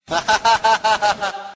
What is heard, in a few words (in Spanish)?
risa